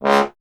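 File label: bass trombone C#; Miroslav Vitous; Solo Instruments VOL-1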